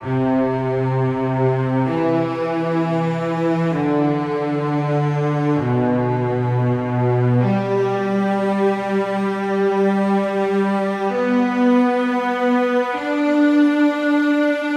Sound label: Cello